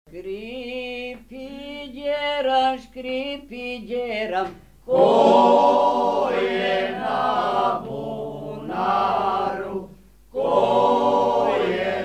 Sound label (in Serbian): viseglasna pesma, Tradicionalne pesme Srba u Donjem Banatu; Skripi djeram, ko je na bunaru; Kozjak